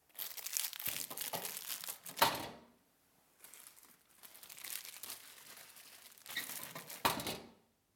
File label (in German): Muelleimer